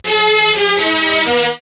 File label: Beat